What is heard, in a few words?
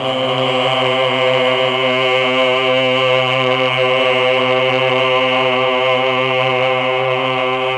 CHOR; ILIO; Propeller Island Legendary M400